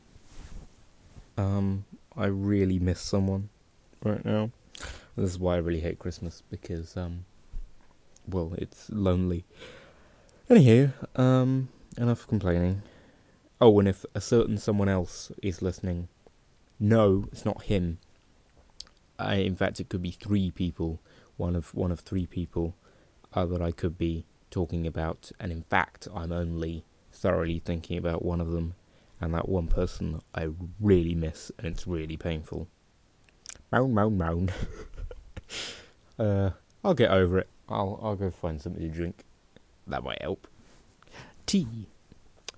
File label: moan moan moan